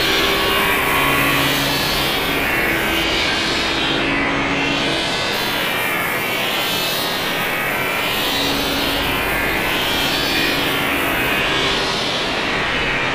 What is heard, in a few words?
Dome Panel Glitch; Boomtown; Titanfall 2